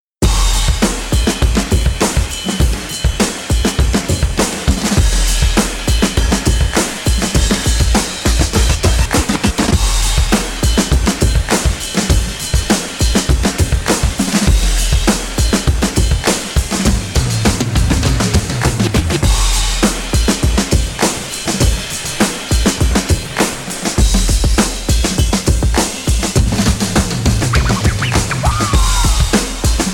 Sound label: Beats